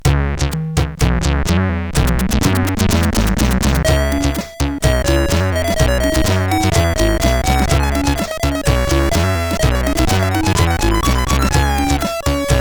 One Style, CSG8580; sid; CSG8580R5

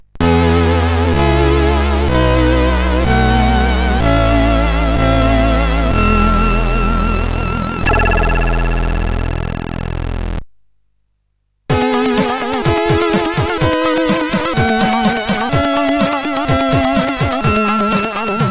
Funky, sid CSG8580R5

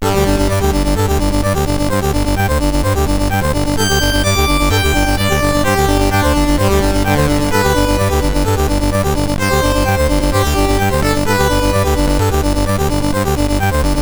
menus, sid CSG8580R5; Games Summer Edition

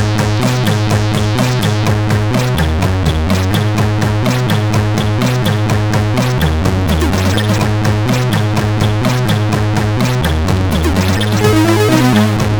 tune, sid, CSG8580R5

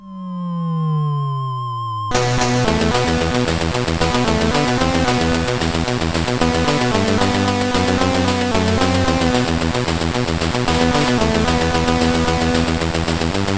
Brady Jam, CSG8580R5

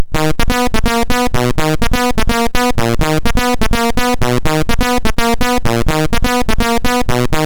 Bass Sample, MOS6581R2; hvsc

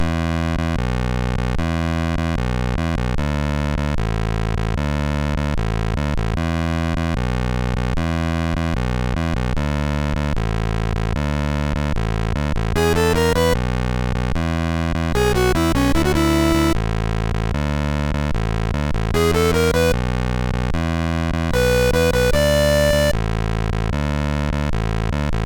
Swong 83, MOS6581R3

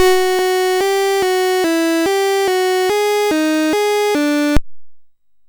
Defenseur, MOS6581R3

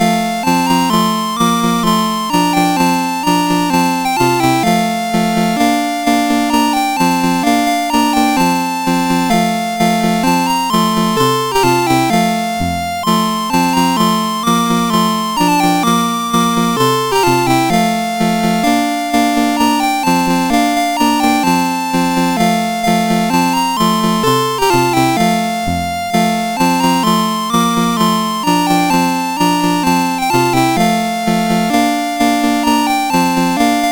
Bulgarian Folk Dances, MOS6581R4; Joseph A Grau

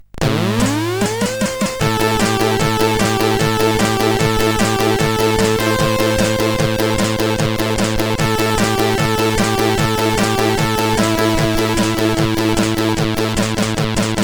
Disco, CSG8580R5

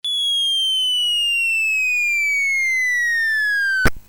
Bomb, Commodore; M6 Bomb BASIC; MOS6581R4

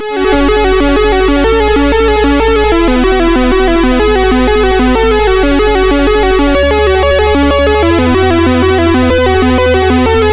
sid MOS6581R2

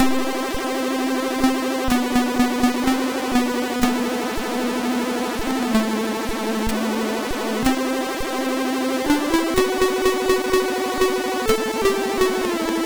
No Limits tune, MOS6581R3; sid

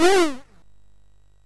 SFX, MOS6581; Narco Police; MOS6581R3

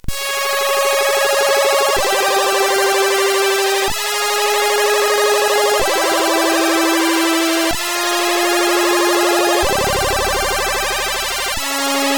Snare CSG8580R5

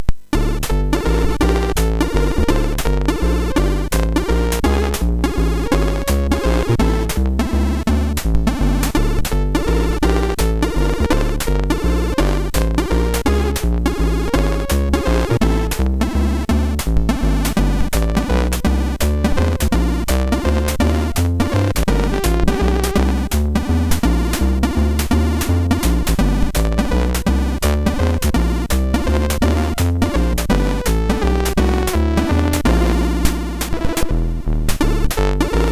Walking Waltz, MOS6581R4

